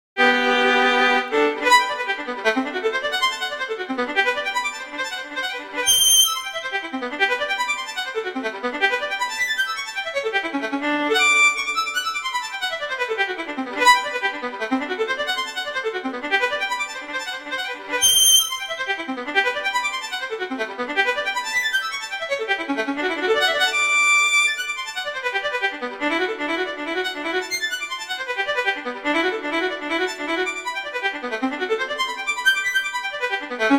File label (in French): Les arpeges - Variation I; Wieniawski; Ecole Moderne